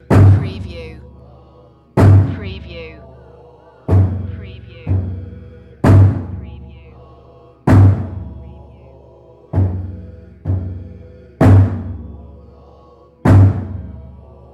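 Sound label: monk Buddhist ceremony chant male drums large bells Kyoto Japan; lynne-publishing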